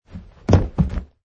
Landing

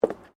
FOOTSTEPS WOMENS LOW BOOTS BOARDWALK STEP

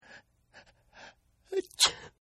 Sneeze; Sneezing; Human; Partners In Rhyme

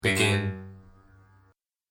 Arcade Game Begin, Retro Inspired; Video Game Sound Effects; Think Nintendo, Atari, C64, Etc.

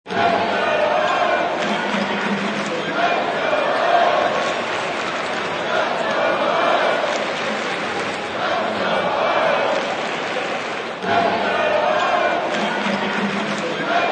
Crowd Yell; Lets Go Hoyas Crowd Yell Ringtone

